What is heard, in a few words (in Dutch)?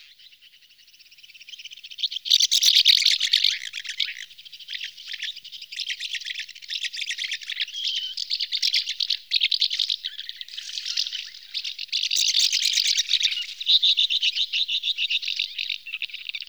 huiszwaluw